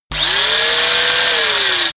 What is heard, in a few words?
sander free short bright